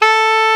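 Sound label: soprano hard A 69, soprano sax; Northstar - New Gold VOL-1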